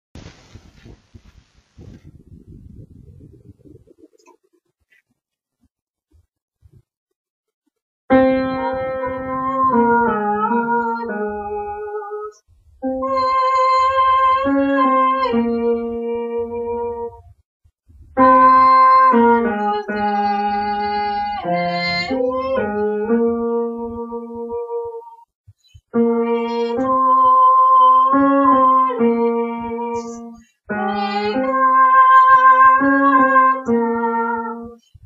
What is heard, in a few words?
bass; Agnus